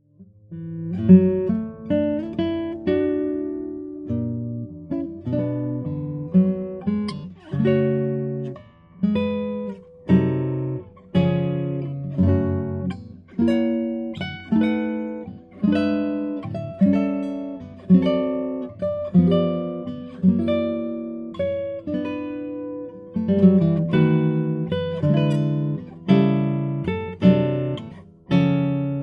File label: Spruce, guitars; Barrios; La Catedral